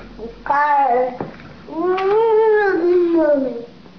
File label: car